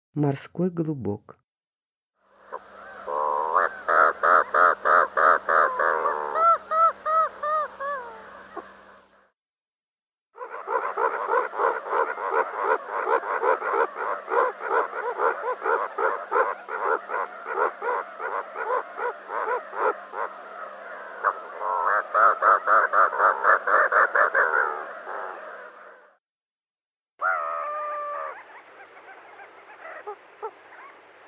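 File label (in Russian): morskoy golubok golos, slender-billed gull voice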